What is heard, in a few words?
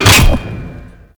Thumper Bot Jump; Warframe; Grineer; Movement; Steps